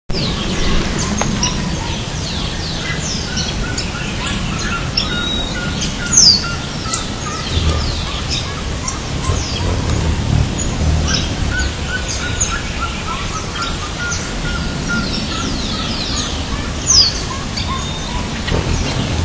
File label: Cariama cristata; Rio dos Touros; Jorge La Grotteria